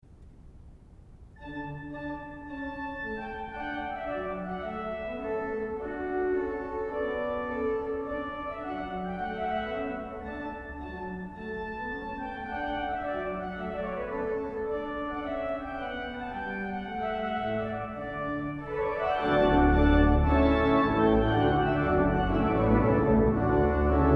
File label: Trumpet Tune; Purcell